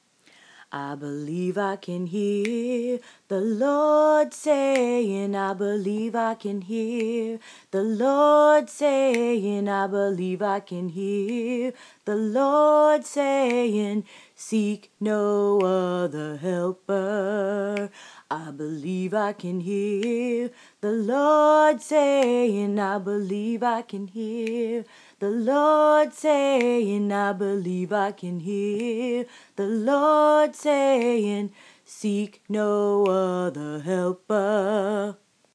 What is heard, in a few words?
tenor; be thou content